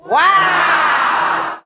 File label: "wow" crowd; ooh ahh